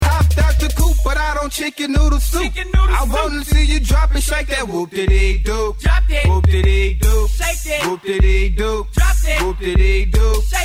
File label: transition; Here are examples of how I used to transition between songs when performing at clubs.